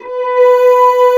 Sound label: SMOOTH PAD; East West; Global Sounds